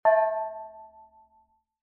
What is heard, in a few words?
tone